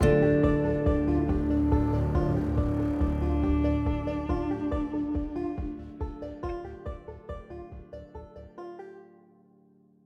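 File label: Exploration Travelling Stop 140bpm 5-4; project zomboid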